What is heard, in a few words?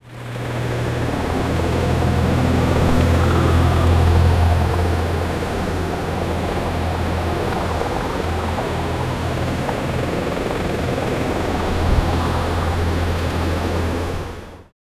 motor boat driving throug; watercraft